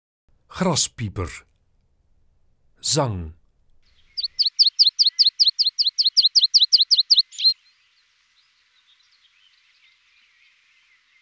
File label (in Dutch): Graspieper, Zangvogels; IVN